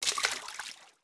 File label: FS Water, footsteps